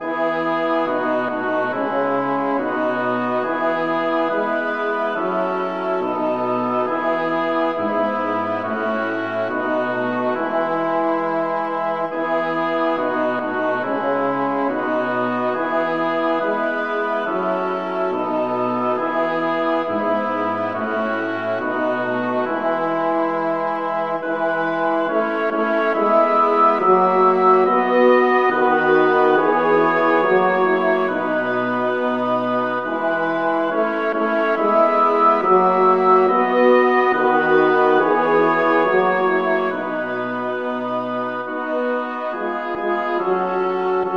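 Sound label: polyinstrument, hymns; Ecoute eglise bien aimee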